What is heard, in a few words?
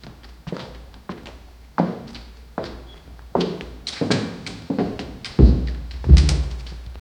quick footsteps moving; departure